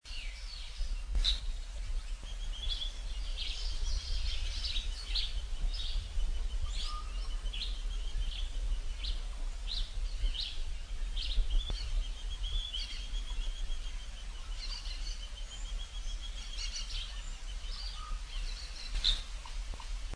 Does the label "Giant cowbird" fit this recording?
Yes